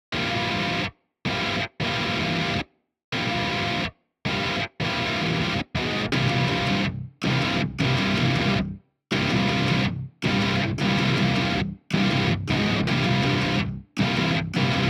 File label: Guitars